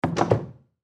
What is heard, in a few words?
Ship Window Close; Transportation Watercraft; Boat Sound Effects; Blastwave